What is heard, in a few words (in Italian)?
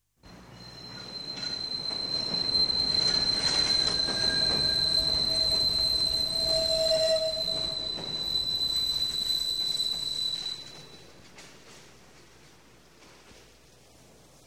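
METROPOLITANA TRENO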